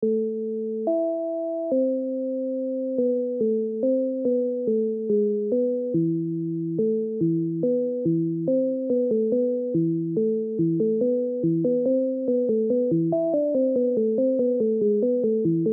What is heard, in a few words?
Fugue G Minor; "Little" Fugue in G-Minor BWV 578